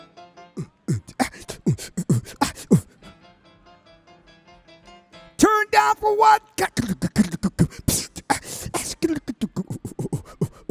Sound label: VOCAL; NAMM 2018; MyMix Recordings NAMM 2018